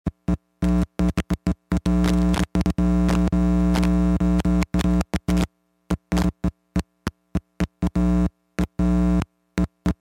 Technology Electronic Electric Arch Intermittent Long